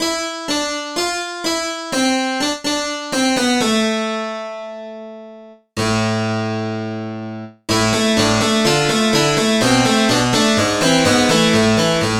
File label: harpsichord; SpectMorph Evaluation #4